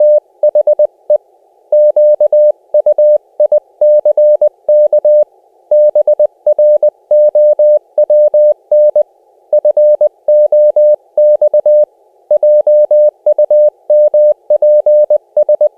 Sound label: 600 Hz, cw; CQ WW CW